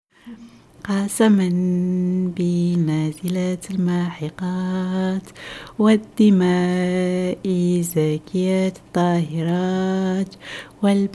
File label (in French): Chant; ABBAYE